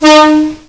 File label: horn; seibu 101